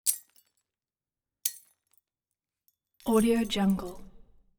light bulb glass shatter; collanderson

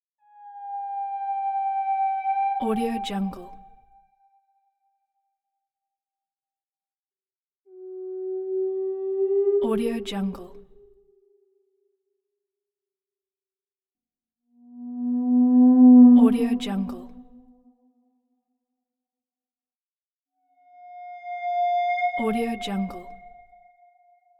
whale

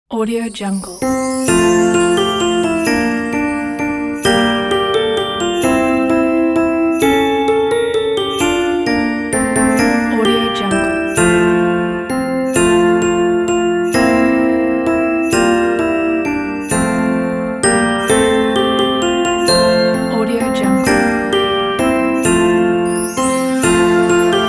merry christmas

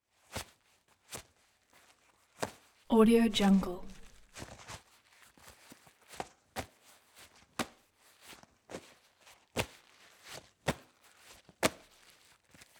leather pouch small throw and catch; collanderson

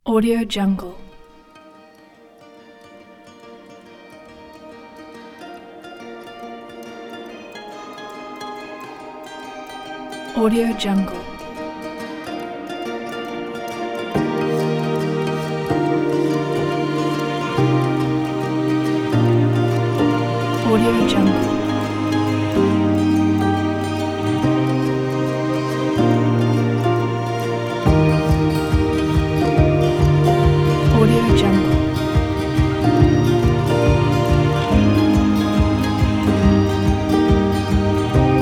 beautiful inspiring folk

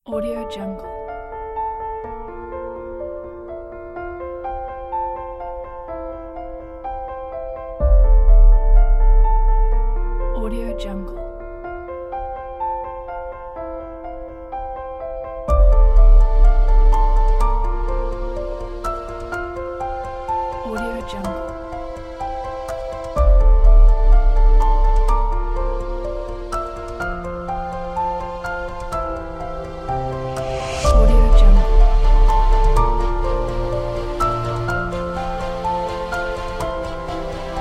inspiring motivational

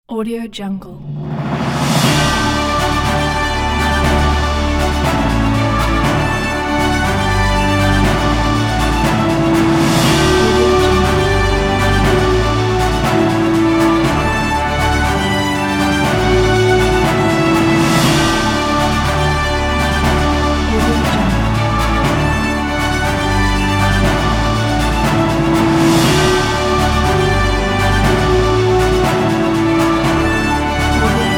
awards

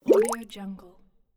bubble surface

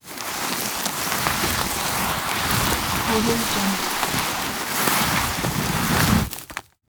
body drag on farm lane

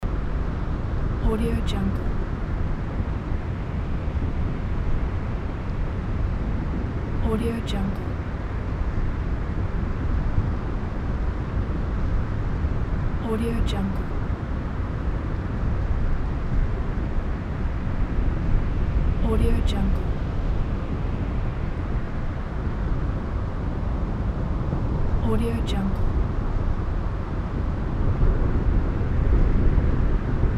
seaside wind

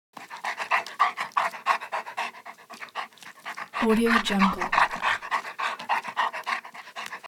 dog panting

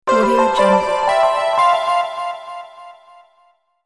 christmas holiday notification

